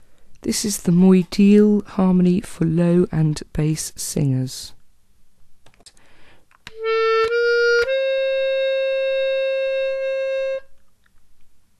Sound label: Low Bass